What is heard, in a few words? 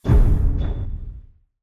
drill boss walk